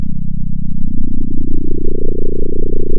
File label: pop, sound of a small motorcycle